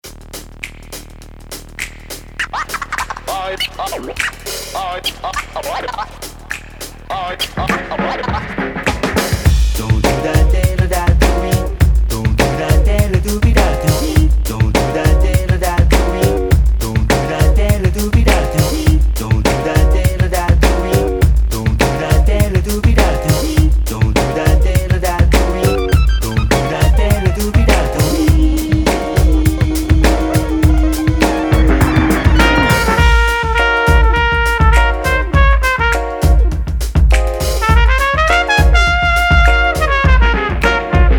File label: Jazz